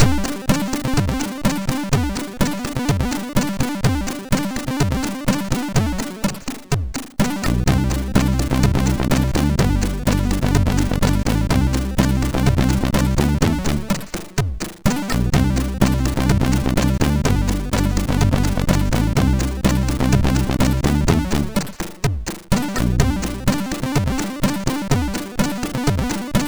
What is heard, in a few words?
Sound Test, sid MOS6581R4